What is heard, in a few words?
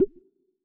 tv menu modal